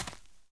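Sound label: walk right medium, dirt foot; The Elder Scrolls III - Morrowind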